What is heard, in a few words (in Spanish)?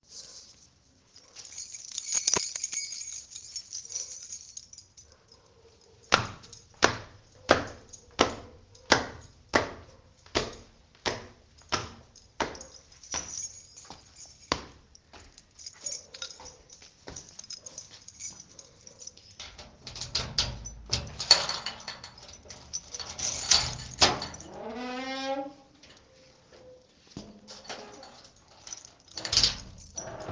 Llaves, pasos y puerta